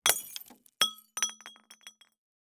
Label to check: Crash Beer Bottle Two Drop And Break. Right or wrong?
Right